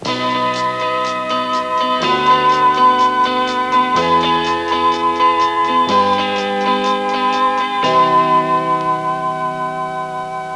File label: mellow